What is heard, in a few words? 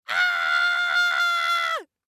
Panicked older female screaming